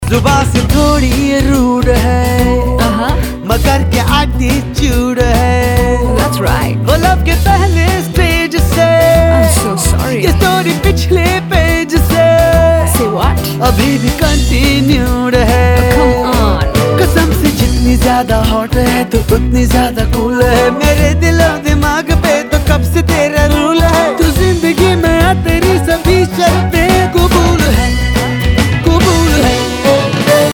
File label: Ringtone, Bollywood; Qubool Hai